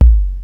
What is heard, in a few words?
TR, drums perc, Roland